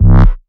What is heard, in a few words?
Bass Shot C